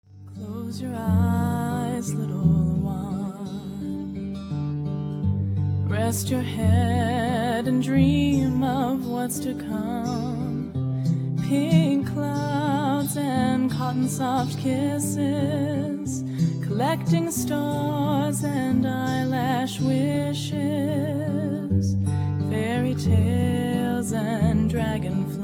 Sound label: lullaby